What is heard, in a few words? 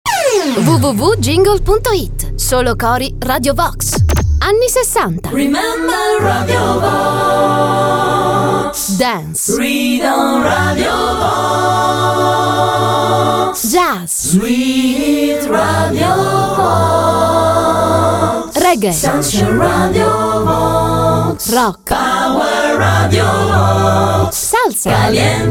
radio vox cori, solo cori